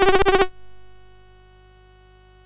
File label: sid MOS6581R4; Movie Monster Game